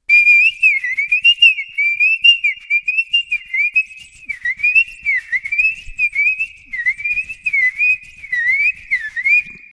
Riroriro; Te Ao o Taonga Puoro

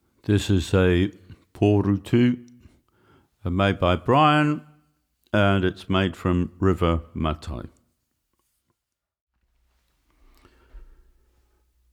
Porutu Nui

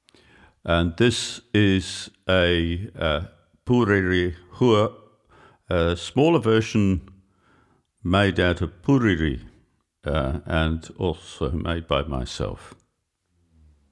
Purerehua Iti

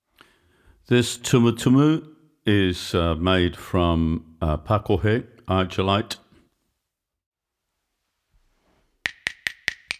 Tumutumu Pakohe

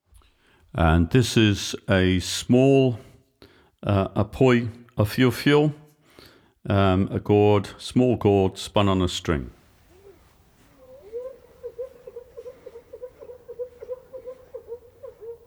Poi Awhiowhio iti

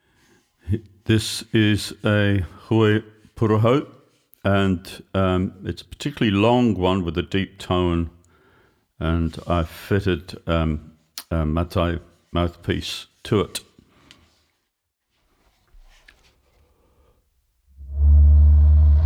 Hue Puruhau Nui, Taonga Puoro

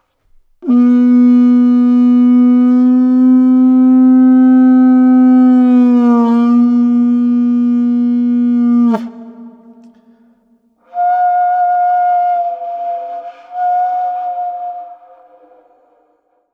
Putatara; Te Ao o Taonga Puoro

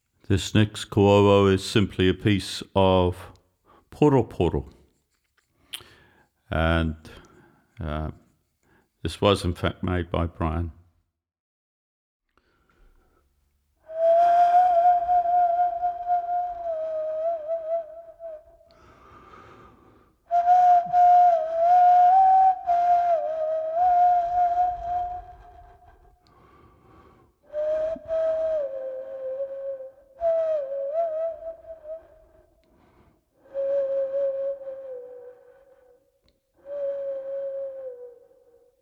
Koauau Poroporo

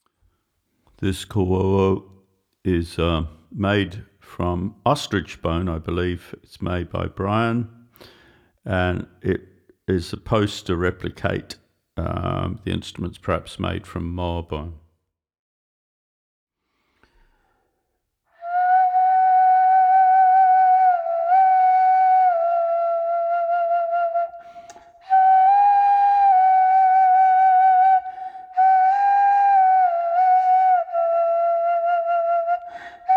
Koauau Wheua Ostrich

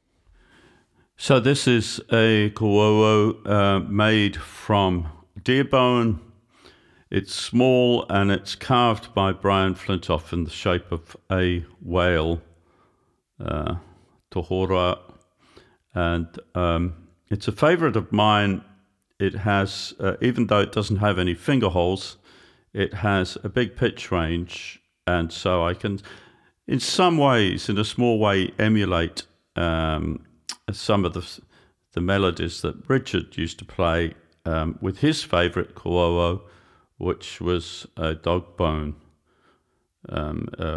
Koauau iti

